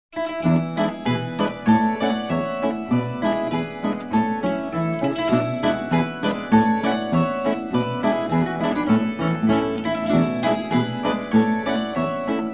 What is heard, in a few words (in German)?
Wirtshausdreher; Kreuth